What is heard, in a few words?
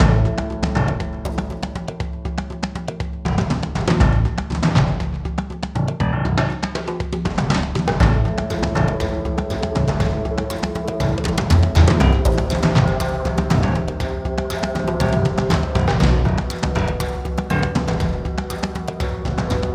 Barren Combat; Aira VR